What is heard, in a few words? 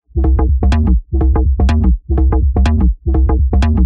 124 Cm, Melodic Loops; M8; Electronisounds